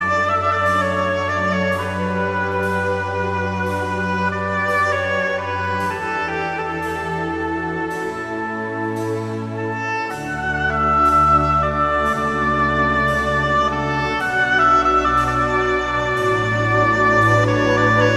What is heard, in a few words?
oboe